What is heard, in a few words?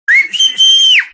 Whistling